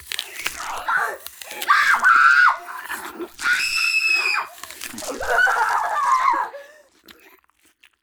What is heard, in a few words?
Female Being Eaten Death; Project Zomboid v41.73